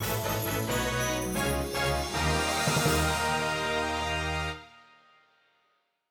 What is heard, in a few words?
Minigame Result; pro yakyu famista 2020 switch gamerip 2020